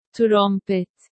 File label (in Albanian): trompet